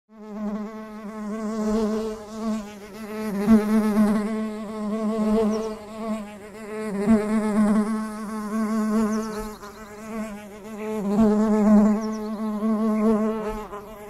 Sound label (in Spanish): zumbido de abeja sonido; semana nubosa granja de miel